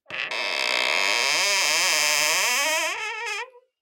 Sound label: cupboard door squeaks; Sonniss GDC